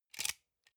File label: sunglasses plastic grab medium